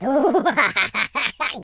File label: laugh, Footy Fan; speech